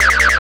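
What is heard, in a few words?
Alert Space; FREE SFX PACK VOL 1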